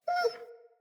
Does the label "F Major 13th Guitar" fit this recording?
No